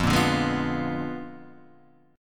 A sharp 7th Flat 9th F

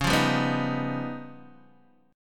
Db 9th Flat 5th Db down Guitar Open D

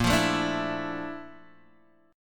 Bb Major Sharp 11th Bb, chord

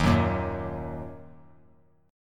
D sharp Minor, chord; strummed; JGuitar

